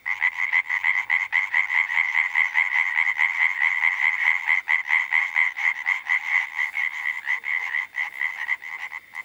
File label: hyla arborea, frogs